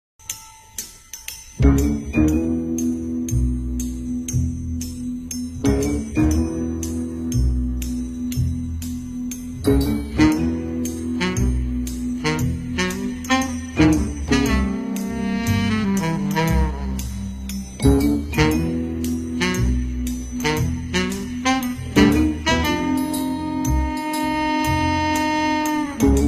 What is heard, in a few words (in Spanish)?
tono de teléfono; Pantera rosa